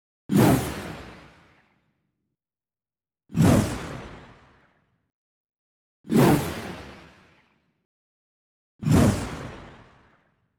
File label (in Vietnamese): tieng ru man tro choi ao thuat